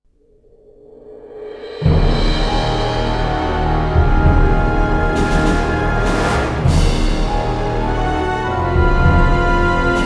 Stinger Battle

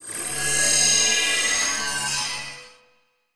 Field Mat Tap; Yu-Gi-Oh! Master Duel

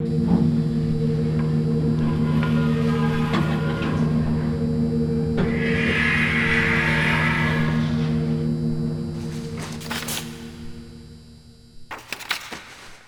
Elevator Sfx

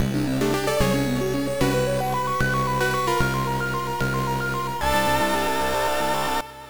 Disappearance (Game Over); Space Manbow; MSX2

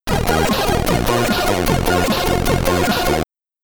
Get Ready! (SID Stereo)

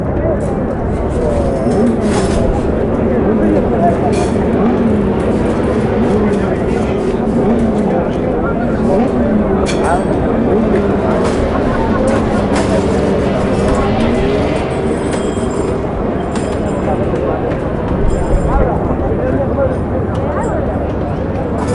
Pit Ambience Clear; MotoGP 14; Milestone; Bandai Namco; vita

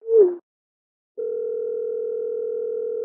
Retry Jingle; friday night funkin hazeys friday night chillin midnight series ost mod; Gottlich x Dispiriting End

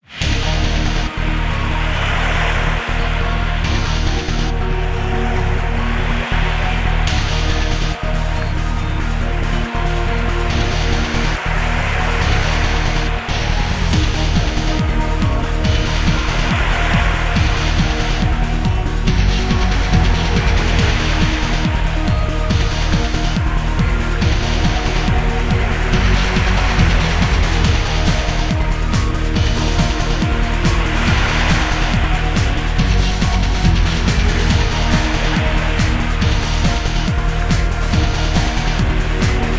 battle sea; Shin Megami Tensei Imagine gamerip

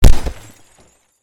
Collision Person Soft

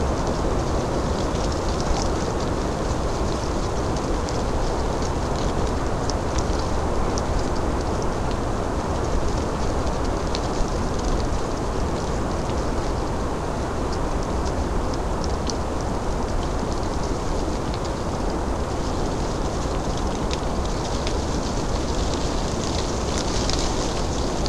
Wind deciduous forest roar leaf detail; Level1 Ice Cliffs

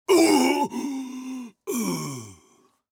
Voices male death grunt; gamerip; Out of Reach: Treasure Royale

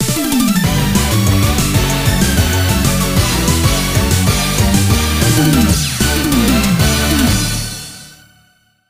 Stage clear; The Legend of Dark Witch 3 Wisdom and Lunacy

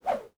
Fight Move; mighty party 2017 gamerip pc ios android

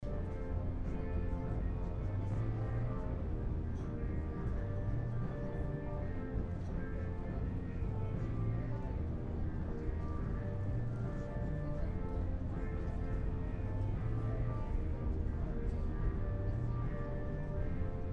Bar Ambience

